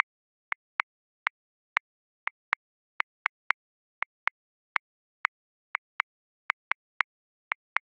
Simple Seven 7/8, Drum Booth; Grooves; Ableton

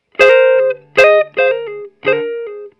Pop Guitar Fill, Electric; Pop Rock

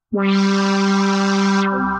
Pad Choir; Ableton; Creative Extensions; Racks

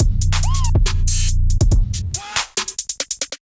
Castle Kit Groove 140 bpm; MIDI Clips; Ableton; Factory Packs; Beat Tools

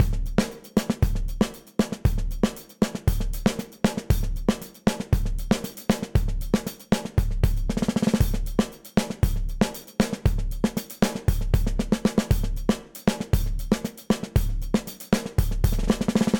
Frying Pan Session Dry 117bpm; Ableton; Factory Packs; Session Drums Studio

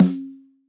SNARE EFX

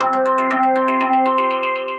ResoBox FM, Synth Rhythmic; Ableton; Glitch and Wash

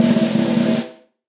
Snare Press Big Punchy; Snare Articulation; Drum Hits; Session Drums Club; Standard Hits; Ableton

